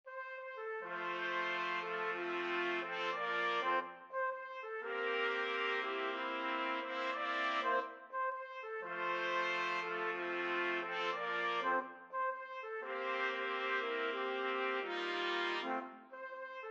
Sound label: whole world 4TPT, trumpet quartet